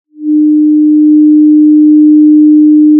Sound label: Sound of a cruise ship horn